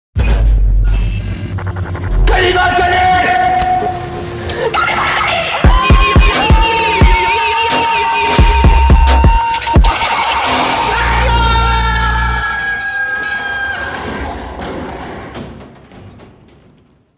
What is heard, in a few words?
jingle